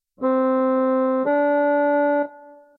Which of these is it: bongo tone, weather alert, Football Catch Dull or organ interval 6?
organ interval 6